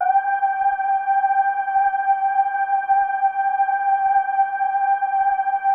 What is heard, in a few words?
GLAMA G4, SYNTH VOICES; Music Store - Claudius Diemeter Schwerlos